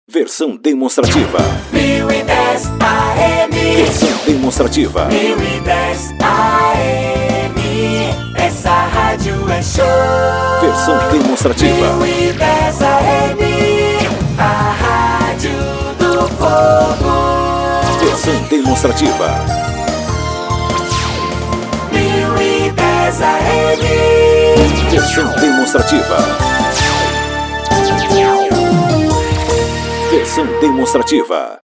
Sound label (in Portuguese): vinhetas AM